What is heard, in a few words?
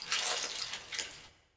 rat; El Rey Arturo